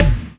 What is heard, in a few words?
Percussions